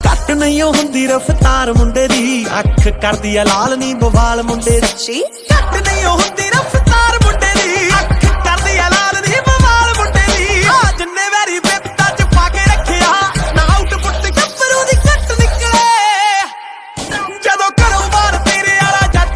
Punjabi Beats Ringtone

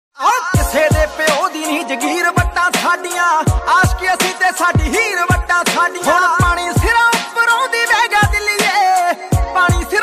Android Phone Ringtone

